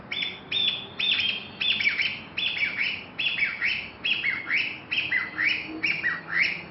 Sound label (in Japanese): kojukei